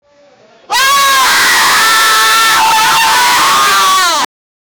women angry, upset

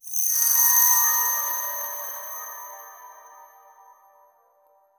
magical sparkle tingle, humming

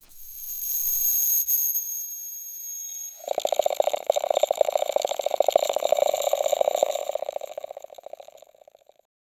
smiling sound; lighthearted

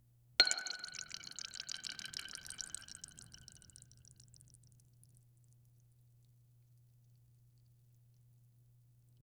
a peeled boiled egg softl

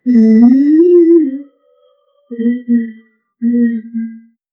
cartoon nervous hum brrr; suspense

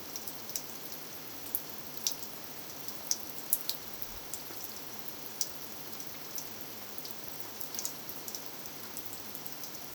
realistic ambient rain so; relaxation

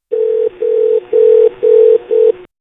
phone redial awaiting for

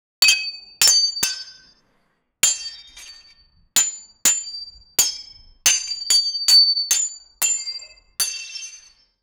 cinematic battle ambience, marching

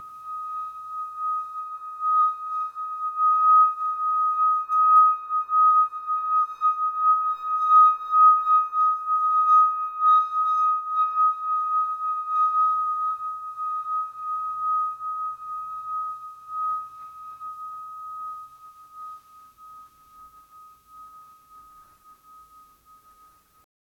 a tibetan bowl drone, meditation

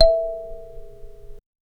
strike the kalimba softly

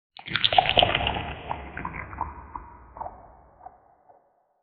short cartoonish eating s

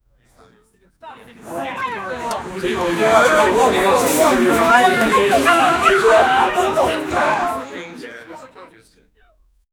a group of people are, reaction

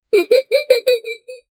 goblin giggling

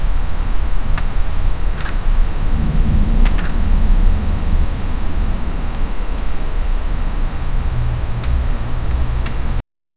fluorescent buzz muffled; shifting

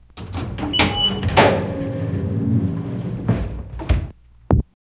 old elevator doors closin, rattle

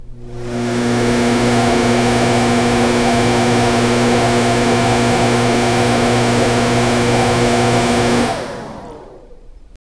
a hair dryer on low; hallway

